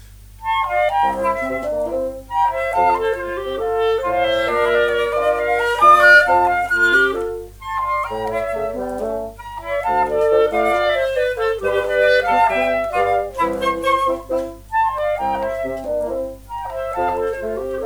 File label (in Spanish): Danza Cubana; Cervantes - 5 Danzas Cubanas (Fl, Cl, 2Bsn); No Llores Mas